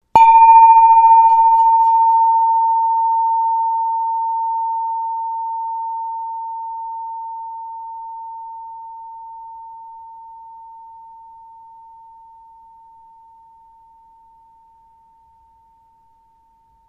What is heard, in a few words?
cosmic tube; siderischer mond